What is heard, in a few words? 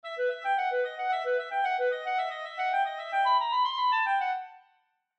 Clarinet; examples in treble clef